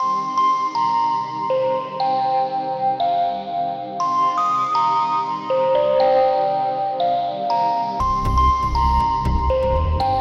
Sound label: crystal